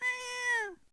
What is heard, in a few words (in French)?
chaton